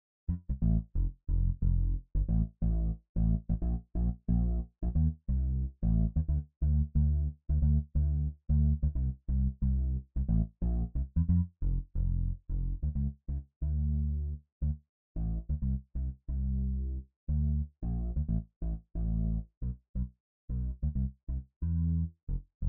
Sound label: Bass